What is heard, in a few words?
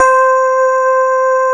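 grand piano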